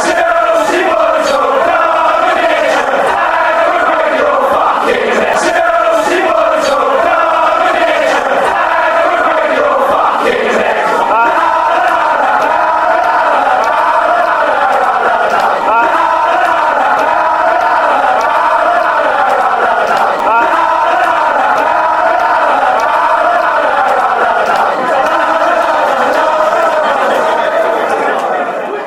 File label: chelsea boys are gonna get fanchants